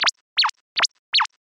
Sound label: cute walk